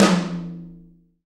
TOM TOM, Roland

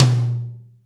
TOM, Drums; Best Service; Real Mega Drums VOL-1

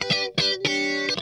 SQUASH, Funk Guitar; 90 s Sample CDs; Zero G

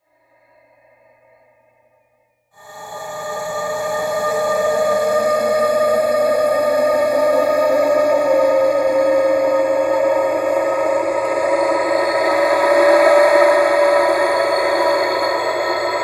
Andean Wind Flute; Sonic Foundry (Sony Creative Software); Continental Drift Aftershocks

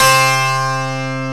HARPSICHORD

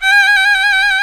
KO-Q G3, KOKYU; AKAI S6000 CD-ROM - Volume 1; Strings

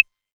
808 Clave, Novation Drumstation

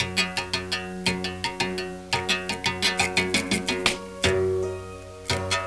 Berimbau; Sonic Foundry (Sony Creative Software) - Crimson Blue and Fabulous Horncraft 4 RnB; DragonDance

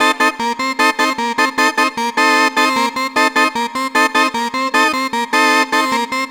Surf Organ; Keys; Dr. Fink's Funk Factory

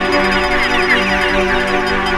Pad; Tear; Pads

